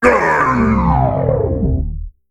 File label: Mech Emote Death